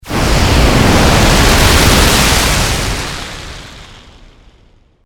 Meteor Break Atmosphere, Meteor, Break, Atmosphere, Pass, By; 5.1; Sound Effects:Science Fiction; BlastWave